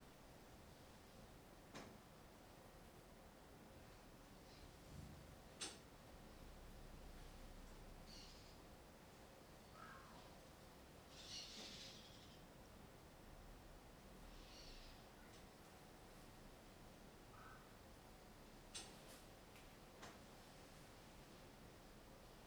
Ambiencia cozinha vazia estalos, passaros e cachorro com latido esquisito; longe; ambiencia domestica

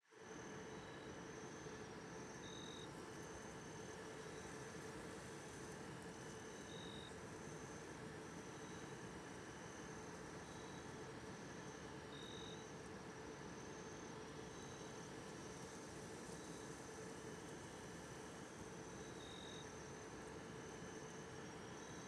Ambiencia no Mirante da Antena perto de Vegetacao com Ruido da Antena; e Grilos; ambiencia rural

